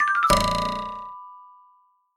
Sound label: chip fail